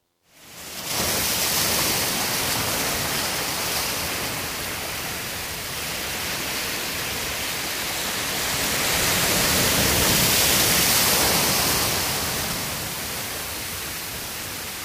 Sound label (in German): Bewegtes Meer, Meeresrauschen